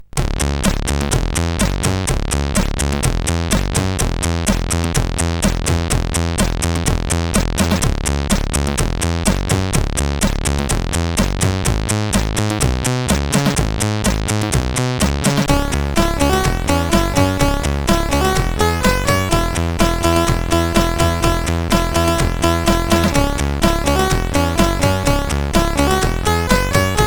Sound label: tune, CSG8580R5; Hundskit